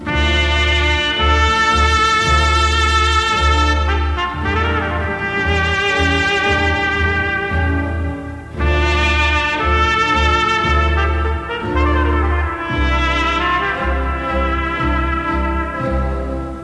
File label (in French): trompette